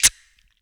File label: VOC DRUM, MOUTH PERC; Best Service Hyper Dance